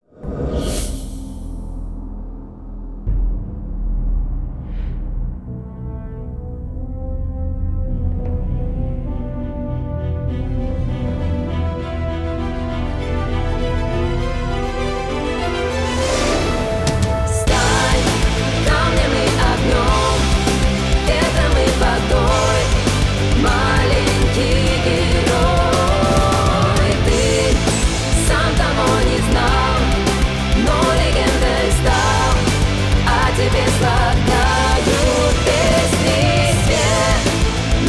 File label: Opening - Russian; Wakfu S1